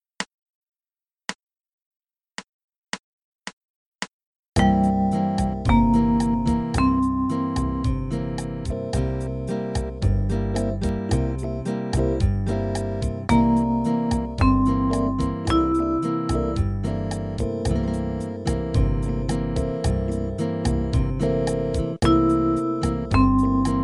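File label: Dom7 Second Inv, arpeggio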